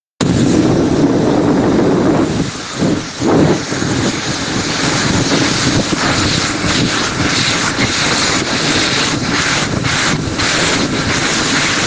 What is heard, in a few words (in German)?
Handtrockner